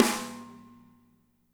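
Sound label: snare left; Spectrasonics; Burning Grooves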